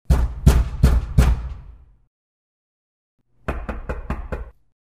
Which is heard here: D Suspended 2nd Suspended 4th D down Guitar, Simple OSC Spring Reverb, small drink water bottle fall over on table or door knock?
door knock